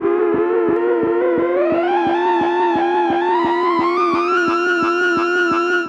pitched, Feedback; Feedback FX; 99Sounds; Dark Engine

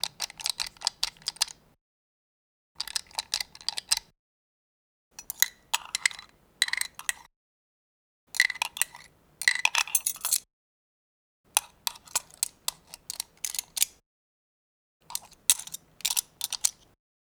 Lock scratch; Alexander Ahura; Locks & Keys

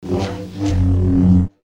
Saber; FXHome

